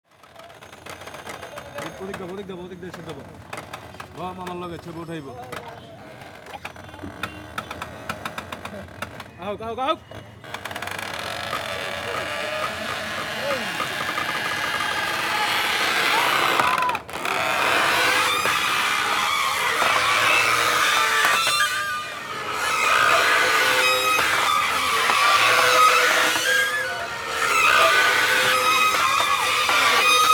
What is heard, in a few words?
Fairy Wheel, Bangladesh; Les Cartes Postales Sonores; NOISE ON EARTH Vol2